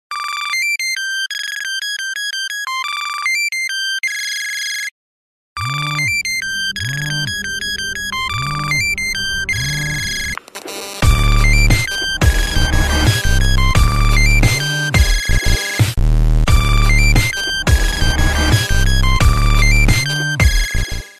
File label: classic rock ringtone